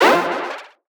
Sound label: Retro FX; Cymatics; Video Game Sample Pack Vol 1